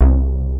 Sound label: ROLAND BS C3, BASS; Q Up Arts; Dream Experience